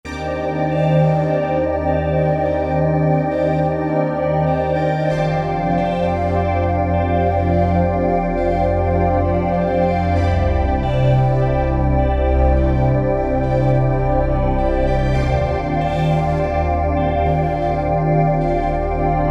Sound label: Glitter Vox